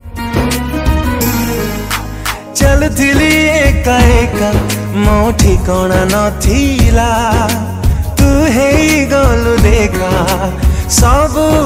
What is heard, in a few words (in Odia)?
Odia Song